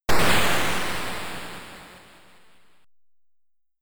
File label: Start Up Sound; land sea air squad arcade